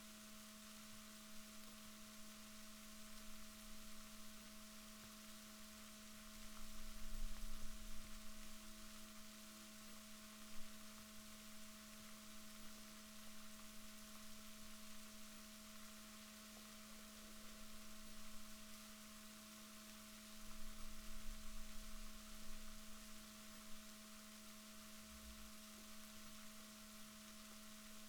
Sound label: rorquals positif, Balaenoptera Physalus Chile